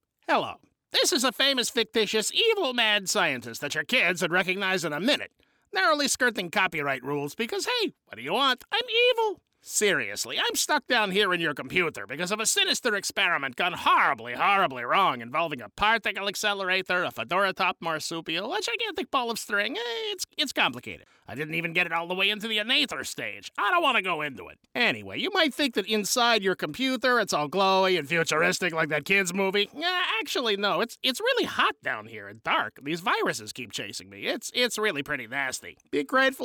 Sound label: Vocal